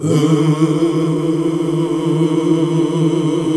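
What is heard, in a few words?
Gospel; Best Service Gigapack 1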